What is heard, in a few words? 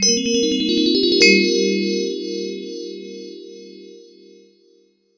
Fantasia, door chimes; rcwl200a